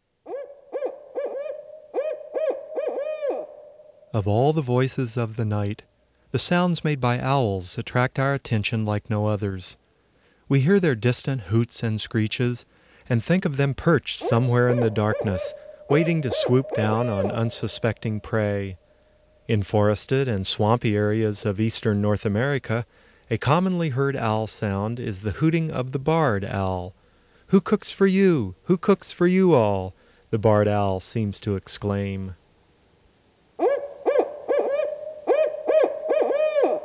Owls, Night Sounds; Animals; A Guide to Night Sounds

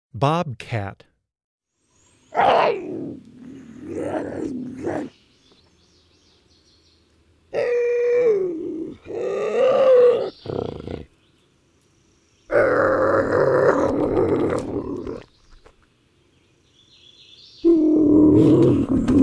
Bobcat, Wildlife Sounds

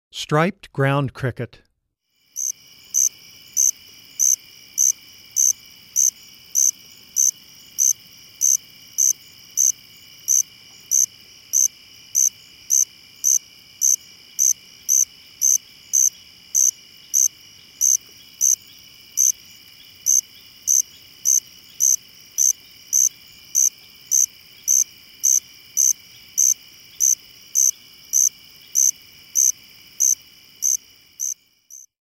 Striped Ground Cricket

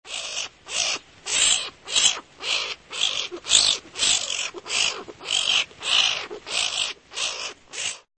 Mephitis mephitis; Chordata

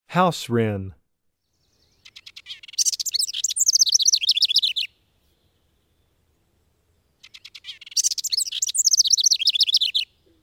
House Wren, Wildlife Sounds

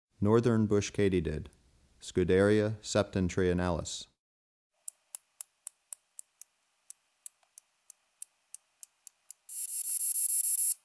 Northern Bush Katydid, Scudderia septentrionalis; Crickets and Katydids

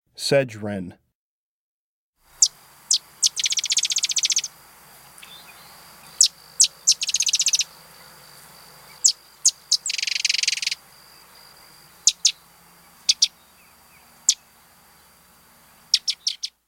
Sedge Wren; Breeding Birds of the Chicago Region

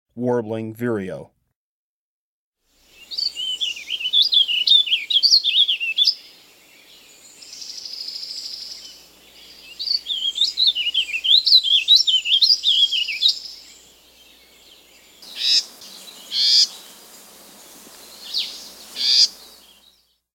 Warbling Vireo, Birds of the Chicago Region

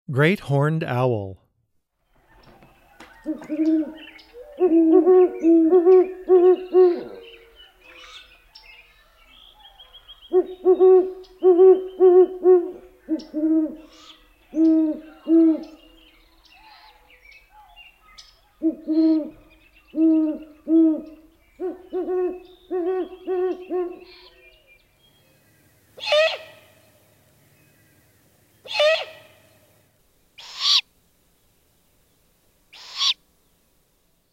Great Horned Owl, Wildlife Sounds

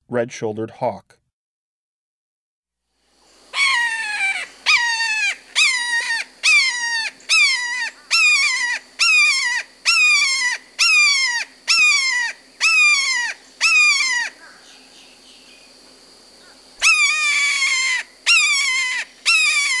Red-shouldered Hawk

